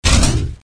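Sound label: magic hit; duke nukem total meltdown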